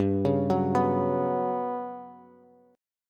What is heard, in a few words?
G Major 7th Flat 5th, Bass; arpeggiated; JGuitar